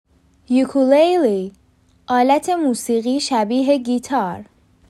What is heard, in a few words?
ukulele